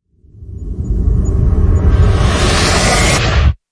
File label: Overlord Wipe; Ninja Tracks